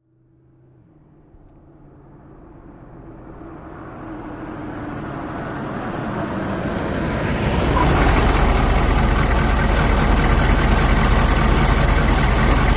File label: Humvee: Fast In, Idle And Off.